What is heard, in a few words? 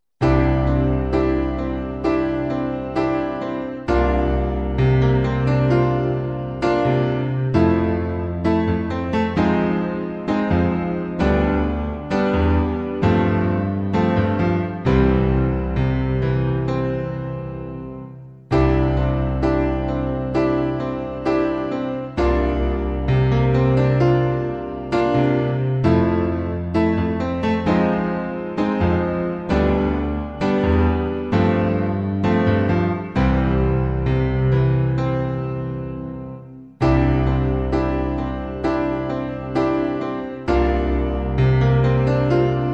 Improv chords Solo